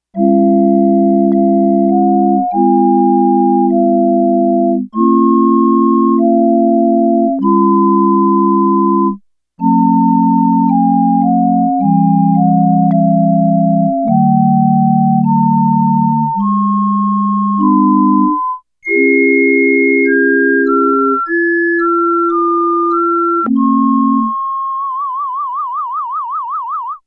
Pipe Organ Flutes

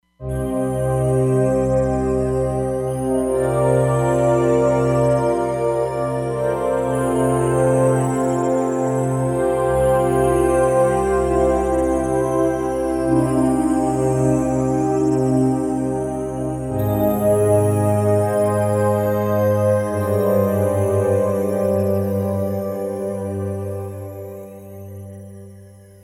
Whisper Pad; Roland Fantom XR

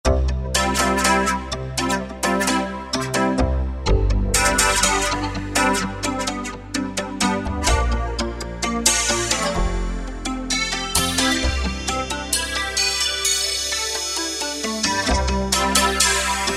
Reflx, Yamaha TG500

